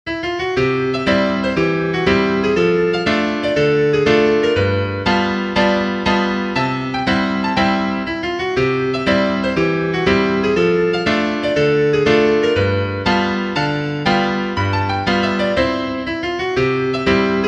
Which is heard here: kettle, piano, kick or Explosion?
piano